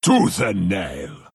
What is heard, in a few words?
attack; lycan